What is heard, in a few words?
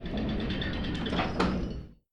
elevator stop; sanctuary1